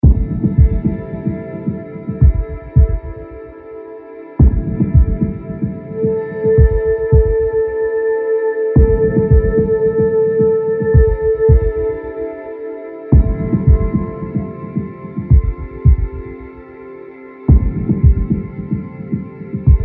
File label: OCEAN VOICES